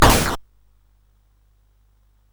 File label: Dark Castle, CSG8580R5